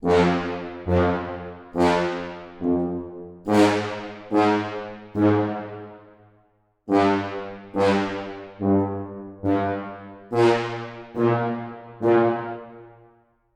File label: tuba; туба